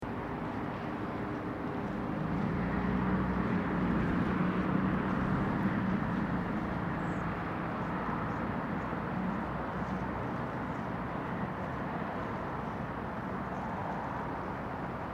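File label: Distant City, Distant city ambience. Use as city wash outside apartment window etc.; Distant city traffic; City Sounds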